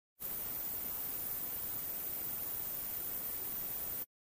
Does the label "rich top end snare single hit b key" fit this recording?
No